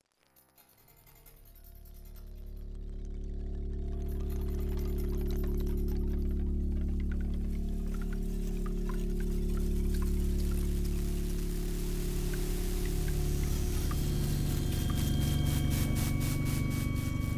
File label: Static; electroacoustic